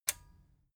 metal button stereo release disable

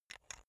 chess piece handle

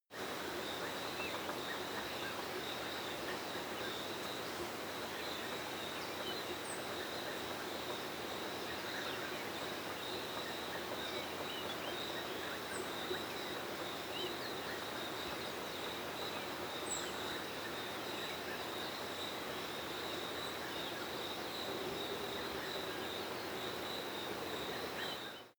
africa winter bird soft

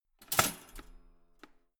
toaster finished jump out

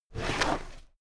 drawer cabinet paper open heavy halfway

